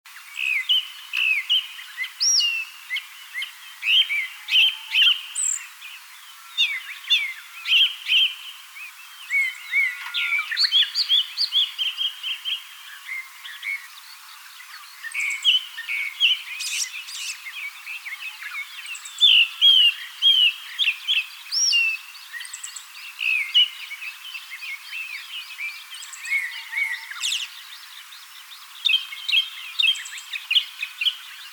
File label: kurotugumi, bird